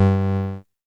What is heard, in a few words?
blop sound